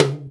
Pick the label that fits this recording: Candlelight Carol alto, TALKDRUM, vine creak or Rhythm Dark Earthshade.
TALKDRUM